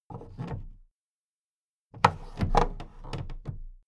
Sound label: big plastic case raise